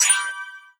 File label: slide